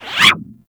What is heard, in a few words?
Skiff Bullet By; Grineer; Arch Skiff